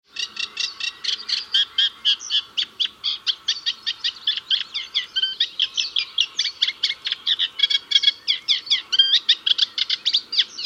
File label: Reed Warbler